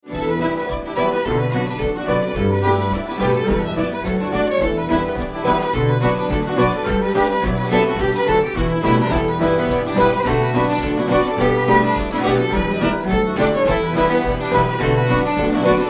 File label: Fiddle; Dougies Fiddle